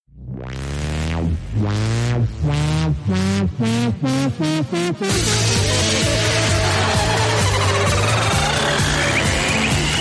execution musics; dangan ronpa

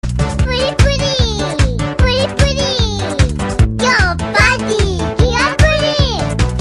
puddi puddi song

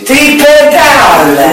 pedal

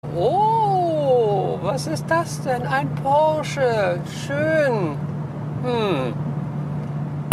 grip; porsche

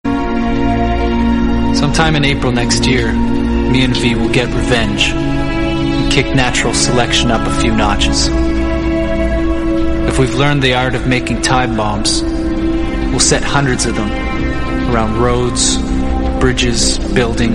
ringtone

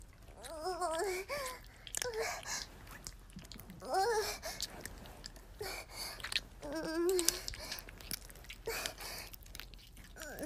mac and cheese stirring

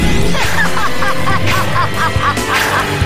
evil laugh

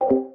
mute sound effect; discord

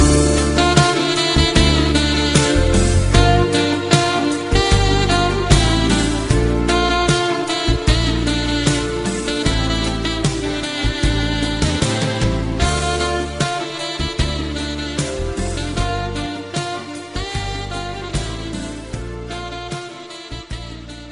saxophone instrumental

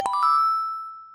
amazed emote; animal crossing

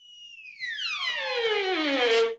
thlamming violin

